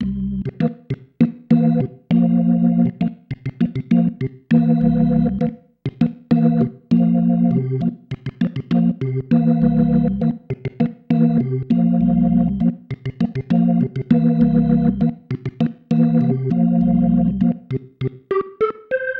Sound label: Pattern, Organ